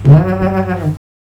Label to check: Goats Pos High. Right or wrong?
Right